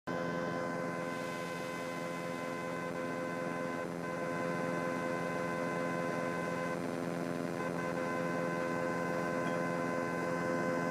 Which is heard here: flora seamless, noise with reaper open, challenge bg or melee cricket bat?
noise with reaper open